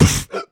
Beatbox Snare, Human Beatbox

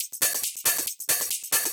Loop 138BPM, Drums & Perc; Vengeance Samples; Freefall Kit; E minor

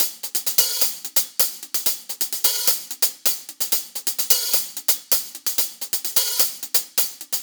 129BPM Hiphouse Kit

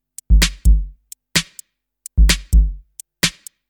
Electro Loop 128 BPM; Vengeance Samples

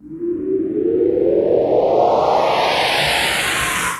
Uplifter; Street Anthems Sound Kit

